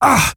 pain; player

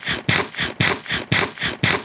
eat

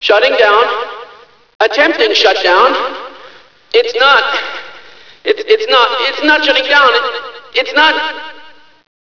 scream; mesa facility

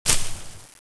brush movement; ambience

